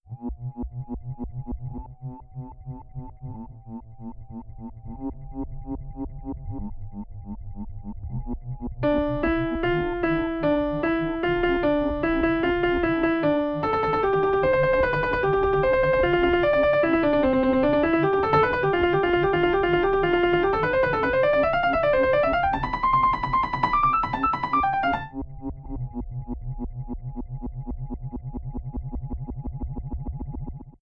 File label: Pianist; Greedy Pianist